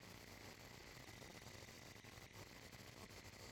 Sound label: El-Hum-Surge Loop; viewfinder